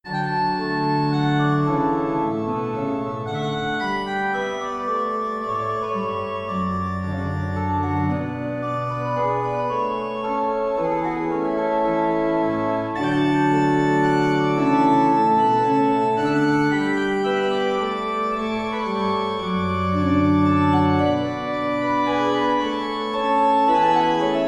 Organ Minuet in D Major

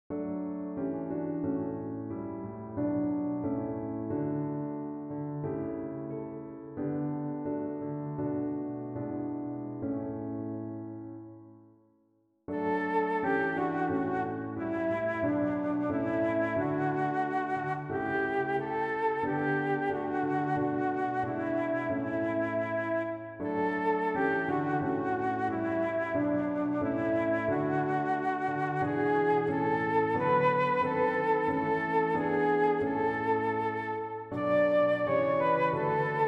Piano Flute, hymns without words; Bread Of Heaven On Thee We Feed; 2 Verses

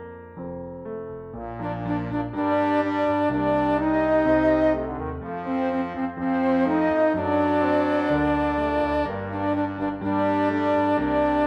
Piano and Woodwind, hymns without words

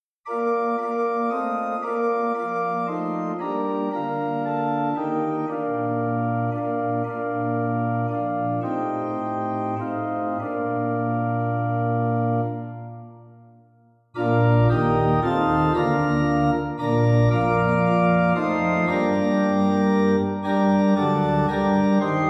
Organ; Abergele; 4 Verses

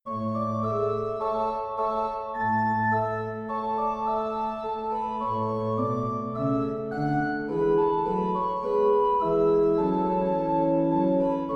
Organ Miniature, Bourrée in A Major; Georg Philipp Telemann

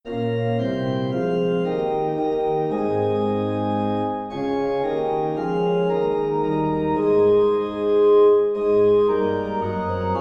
Organ

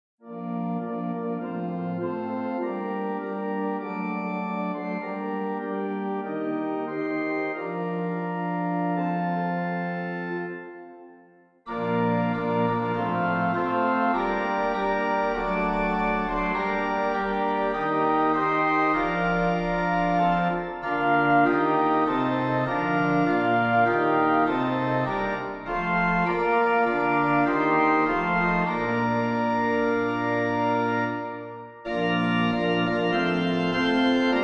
Organ

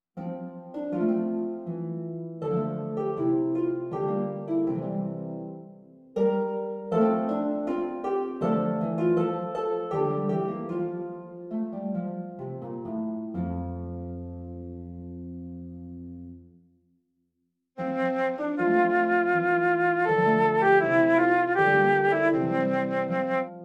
Flute Harp; May The Road Rise Up To Meet You; Gaelic Blessing; 1 Verse

